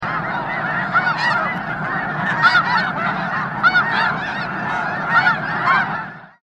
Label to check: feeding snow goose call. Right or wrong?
Right